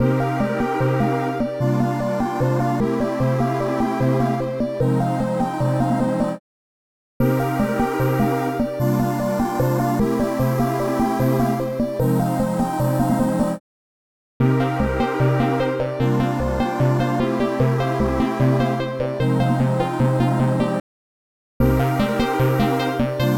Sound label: S2400 ambient SYNTH Dry Wet; BebeMIX